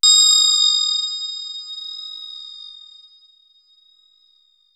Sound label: synth bell; AKAI S6000 CD-ROM - Volume 5